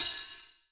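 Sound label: HH thin open, break beats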